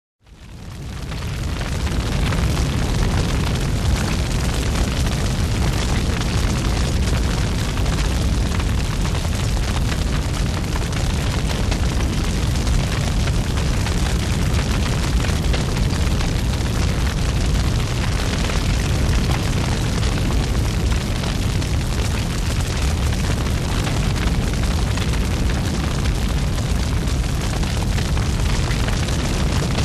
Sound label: Big Fire burning